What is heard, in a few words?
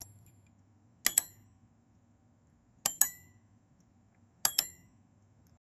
confident wrench tighteni; mechanic